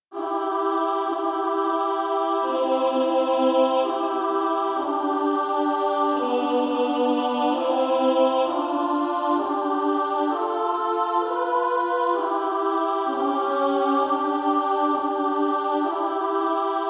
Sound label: Female Choir SA